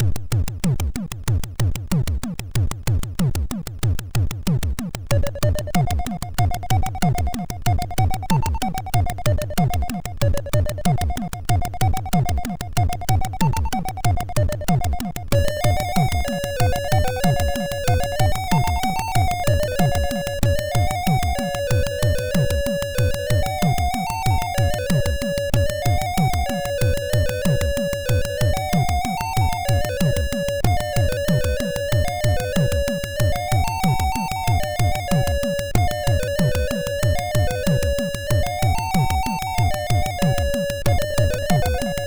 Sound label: MOS6581R2, sid